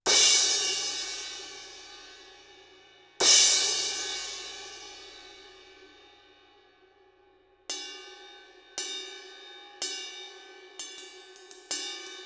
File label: Cymb Crash; 30 Degrees From Vertical